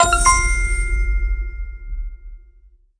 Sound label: ui notification